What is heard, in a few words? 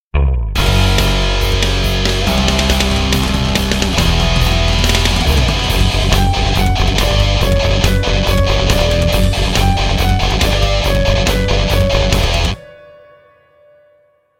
Rock Intro